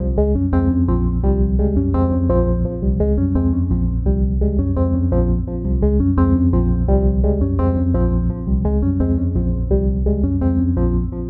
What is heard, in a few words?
Synth Hartloop; Sonic Foundry (Sony Creative Software); Knutrix Drum & Bass